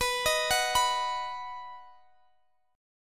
B Major B down Guitar DADGAD

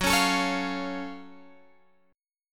Gb Minor Major 7th Gb down Guitar Drop D

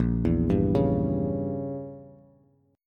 Bb Diminished 7th Bb Bass; arpeggiated; JGuitar

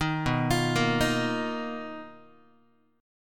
B Major 7th Flat 5th B down Guitar; BM7b5; arpeggiated; JGuitar

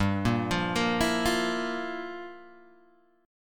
G Minor 13th G down Guitar Open G

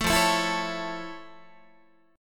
F Augmented Major 7th, Guitar; strummed; 12,13,12,14,13,12; down; 4A,4E,5A,5D,5Gsharp,6Csharp

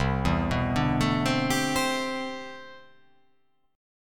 C Major 13th C

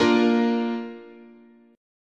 Bb Major, guitar chord

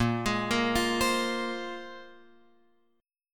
A sharp 7th Suspended 2nd, Guitar, Open F; A#7sus2; arpeggiated; x,5,8,5,8,7

